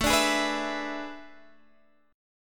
A Major 7th Suspended 2nd Suspended 4th, Guitar, Half Step Down; AM7sus2sus4